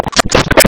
Short Sword Impact Flesh; Weapon Impacts; Dendrit Short Sword; Combat

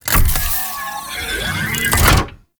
Turret Harness Side Unequip; Warframe; Vehicles; Rail Jack

